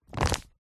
book folder plastic wallet style close

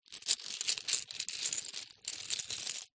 shells small handling; foley

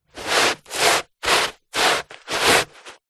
shoe sneaker scuff carpet

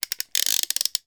toy ratchet wind

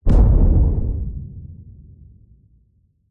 sound design thud hit punchy slam dull; zapsplat

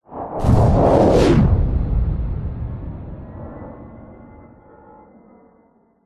vehicles plane fighter jet sonic boom designed

